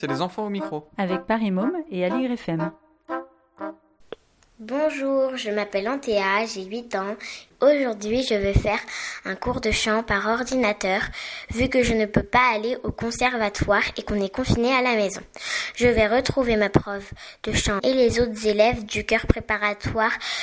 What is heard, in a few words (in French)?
Mon cours de chant par ordinateur; 8 ans